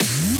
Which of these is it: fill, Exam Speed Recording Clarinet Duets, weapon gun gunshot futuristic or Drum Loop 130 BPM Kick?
fill